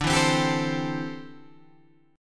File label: D 7th Suspended 2nd Suspended 4th, Guitar Open G